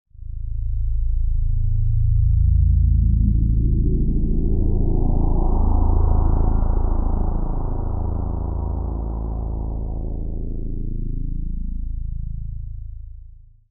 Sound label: SPACE SHIPS; SpaceSounds